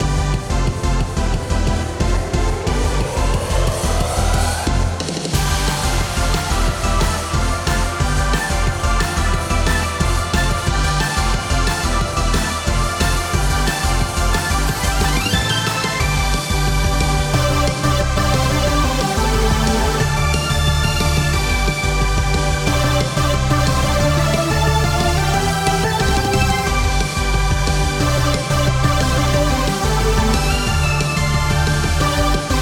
sky blue street climax bpm180, Music for racing game.